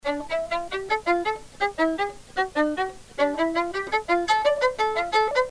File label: spiccato, articulation